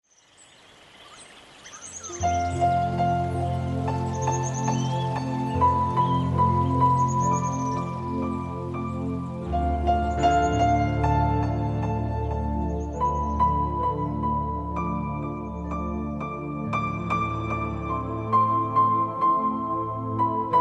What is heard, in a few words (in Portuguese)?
Um Piano E Um Jardim